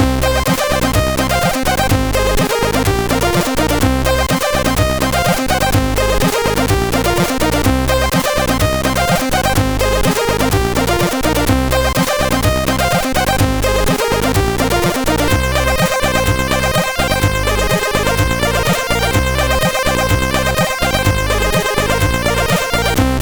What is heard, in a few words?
Short Rave beat, 8580